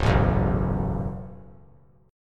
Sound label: C sharp 7th Suspended 4th Sharp 5th, C sharp, chord; strummed